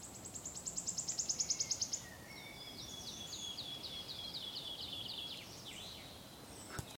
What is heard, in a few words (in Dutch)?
Fluiter; Planken Wambuis